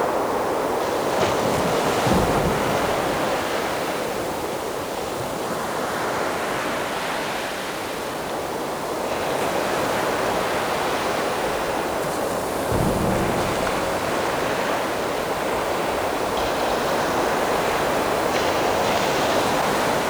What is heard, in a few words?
ambience harbor no rain